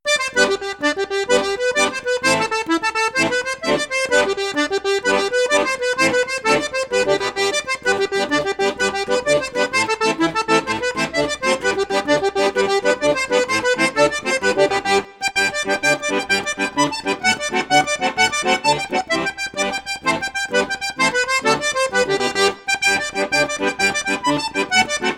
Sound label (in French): registre flute juste flute haute piccolo, accordéon; cavagnolo professionnel 12